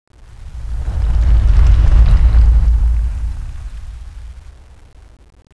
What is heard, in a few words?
quake